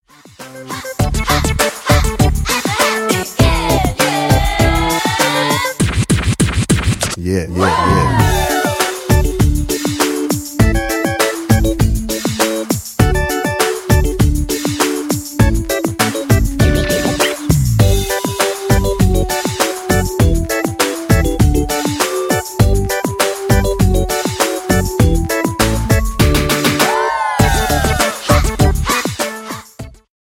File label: One Big Happy Day Karaoke